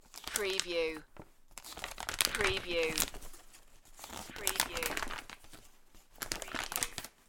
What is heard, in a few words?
Magazine Turn Pages Briskly